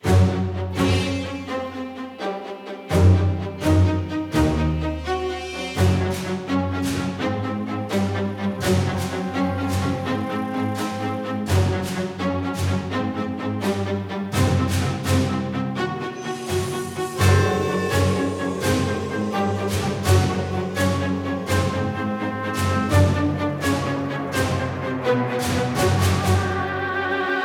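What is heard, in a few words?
Dungeon, 84 bpm, G flat minor; 4/4; Woodwinds + Strings + Brass + Percussion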